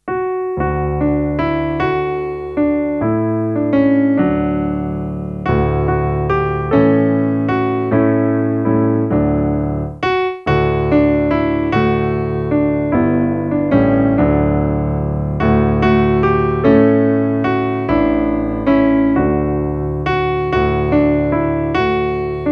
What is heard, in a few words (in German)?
Wir sagen euch an, Klavier